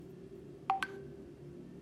Computer

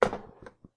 Cup Drop

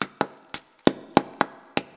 Baloon Boom

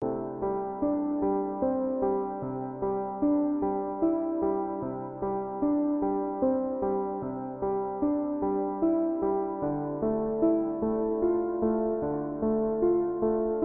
Piano Loop

